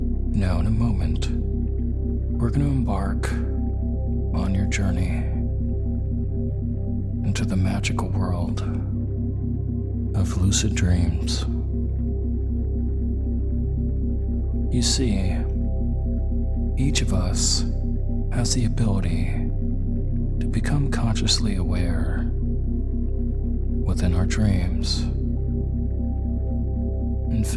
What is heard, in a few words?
Forest of Dreams With Isochronic Tones